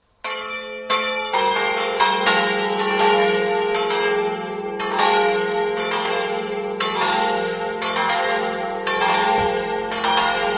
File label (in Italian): campane; smauro